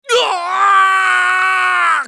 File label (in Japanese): ウィザード男･死亡